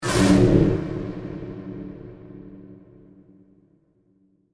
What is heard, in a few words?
Distortion Whoosh, Dark; BlastWave